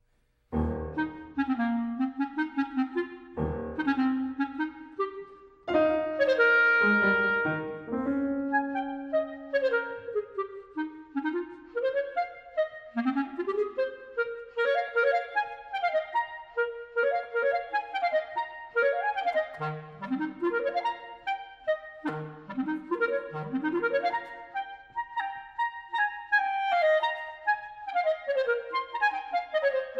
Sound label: Sonata for Clarinet and Piano - Allegretto guisto